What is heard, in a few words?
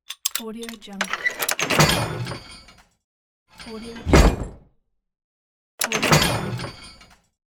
metallic door